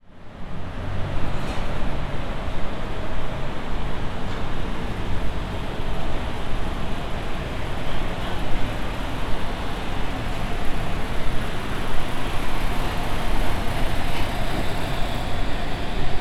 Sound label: Ventilation outlet; Sonic Rupture